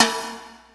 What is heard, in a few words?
perc, Percussion; Trance Explosion Vol1